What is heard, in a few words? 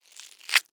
Horror Scary Violence Scoop Eye Balls Out Of Socket Fast